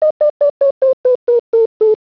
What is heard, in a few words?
RADAR